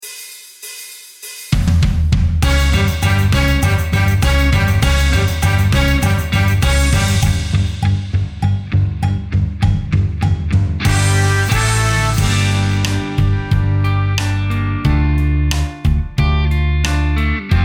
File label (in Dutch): instrumentaal